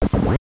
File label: enter door; smb2